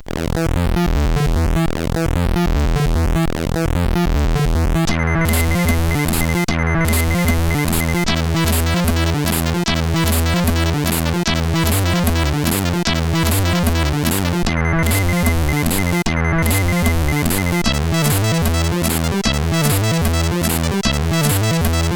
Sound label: Techno Tune, CSG8580R5